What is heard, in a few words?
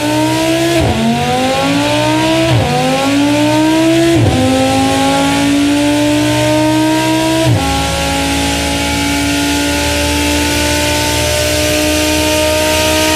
Audio engine